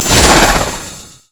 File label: teleport; LoL; diana skin02